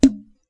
Bubble; Bubbles

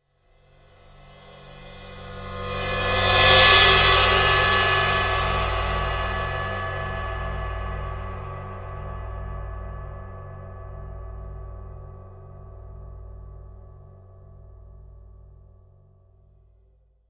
Cymbal; Percussion Samples

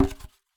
Polystyrene Rattle

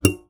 Classical Guitar Extended Technique

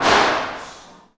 stairwell

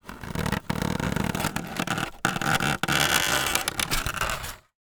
Polystyrene Creak

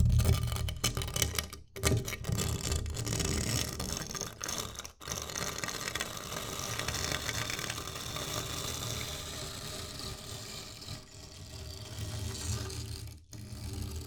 Plywood Sheet Wire Brush

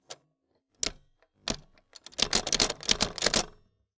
Typewriter

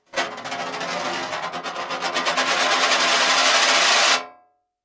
Coin On Metal Tray; Coins

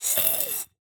Shake In Glass, Medium Wine Glass

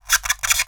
Polystyrene Squeak

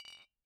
Bottle Struck

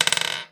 Coin Drop

